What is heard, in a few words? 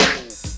SD, Dance Mega Drums; Best Service